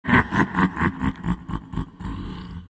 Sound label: laugh; dota; outworld destroyer